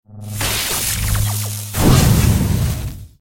electro nexus death

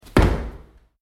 footsteps land concrete stairwell

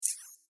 subtle tech interface

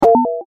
sci fi electronic

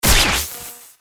electrical fuse burst

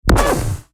particle canon

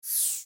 min max down

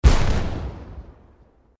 cannon

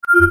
scifi electronic

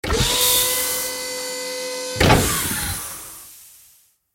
futuristic air compression door with servo

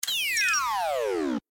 detonator deactivate

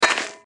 Lay Mine

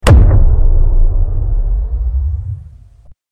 nexus explosion

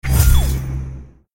powerup

